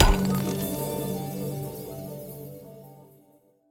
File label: Object Crate Open